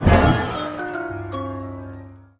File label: Pop; Lethal Company